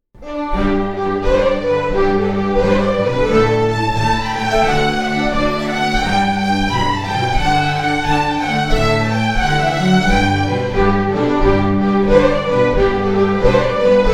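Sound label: Jig, Scottish Fiddling; Miss Hannah's Jig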